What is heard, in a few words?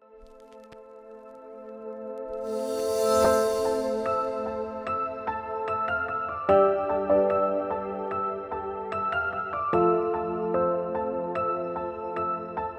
ana Synth